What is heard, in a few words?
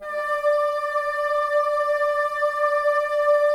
Bassoons D 5; Miroslav Vitous - Woodwind Brass Ensemble